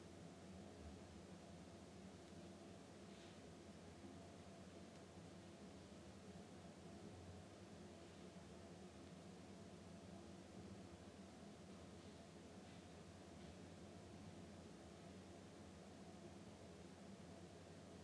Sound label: amb